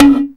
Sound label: Woodblock, Roland JX-305